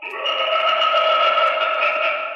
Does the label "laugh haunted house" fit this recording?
Yes